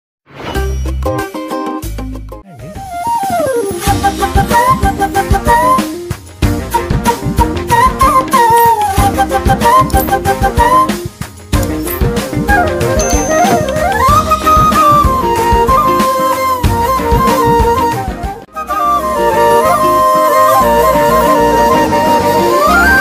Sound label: trailer love bgm; swathi muthyam; bellamkonda ganesh; varsha bollamma